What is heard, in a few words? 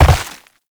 Land Dirt, Movement; Warframe; Uriel